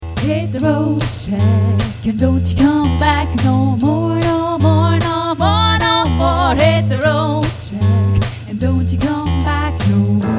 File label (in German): Mittelstimme